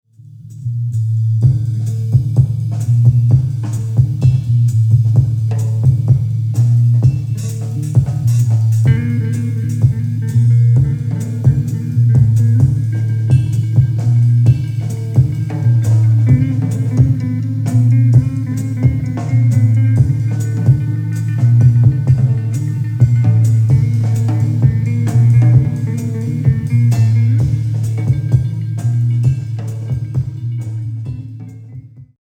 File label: Cassette 1990s Rock Music